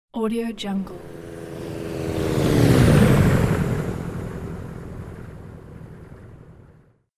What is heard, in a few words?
small airplane quick flyby